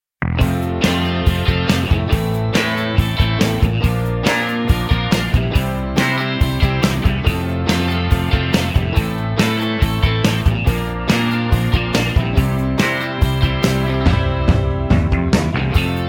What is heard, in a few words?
No Backing Vocals